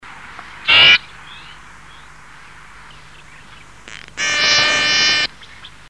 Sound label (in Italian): Trombettiere alirosse, uccelli